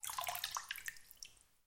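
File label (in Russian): вода купание в пространстве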